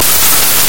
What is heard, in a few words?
Babby Laaf